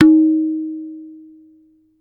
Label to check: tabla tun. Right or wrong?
Right